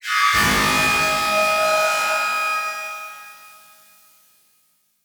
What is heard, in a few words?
Transition Hit Impact Long; Production Element